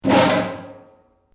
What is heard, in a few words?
METAL BOX; Metal (Sound Effects:Materials)